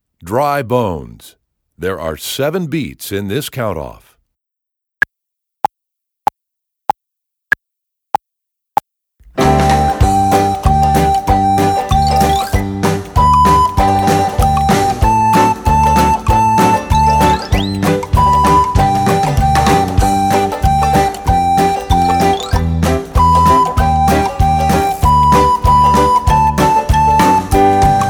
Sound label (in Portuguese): Dry Bones Com Flauta Guia; Nivel 1; 1.o Ano de Escolaridade; Obra Obrigatoria; 11o concurso de flauta de bisel municipio de redondo